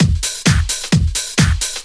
drum 130 trance

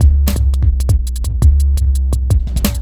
Beat, Drum N Bass

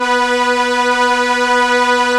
STR ARP, ARP Strings, ARP Slow Str

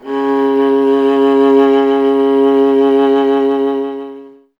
viola C; Miroslav Vitous; Solo Instruments VOL-1

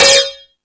Rap Session, E-MU Pro-Cussion

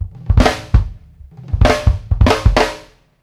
drum fills; Sampleheads; New York City Drumworks VOL-1

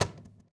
CAJON; Univers Sons - Raricussions

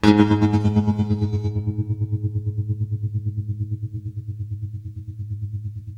TREM G#1, Guitar, TREMELO; East West; Steve Stevens Guitar Samples Collection

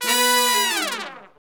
BRS LNG FAL, R&R Falls; Roland L-CDX-03

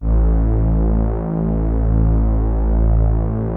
Prophet 5 A2; Hollywood Edge - Giorgio Moroder Rare Synthesizer Collection

